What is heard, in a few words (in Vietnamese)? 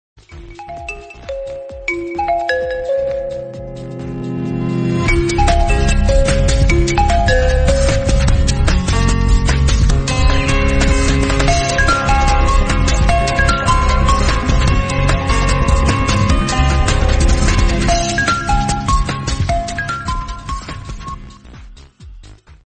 khong loi oppo reno11 pro